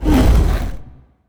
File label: Stone Move; helltaker